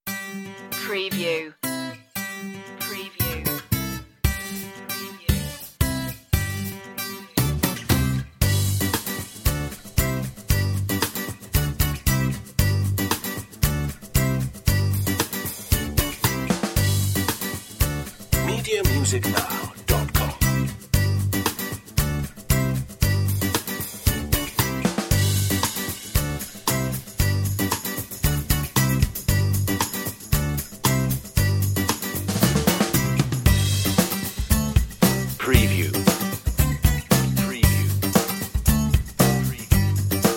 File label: clear as day underscore